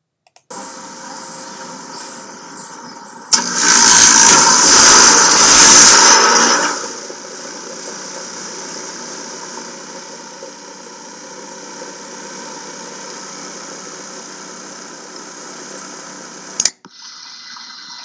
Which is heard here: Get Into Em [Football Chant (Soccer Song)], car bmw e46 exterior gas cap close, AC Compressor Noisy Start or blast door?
AC Compressor Noisy Start